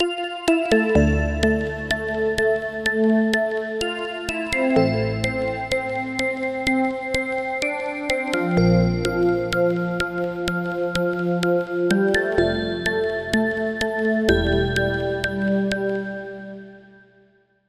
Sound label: Key - Festival Ringing; Routed Sound; Spire Expansion; Story Walker Vol.1